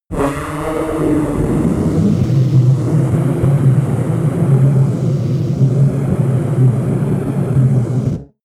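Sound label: rumble storm long, science fiction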